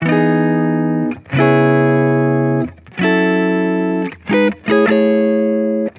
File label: Electric Chord Loop 160 BPM E Maj; Cymatics